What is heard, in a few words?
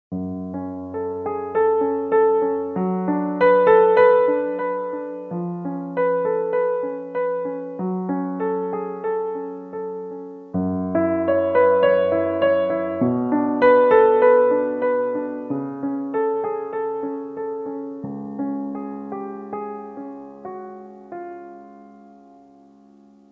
alesis prestige artist